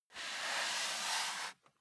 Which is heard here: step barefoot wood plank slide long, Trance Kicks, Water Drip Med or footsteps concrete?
step barefoot wood plank slide long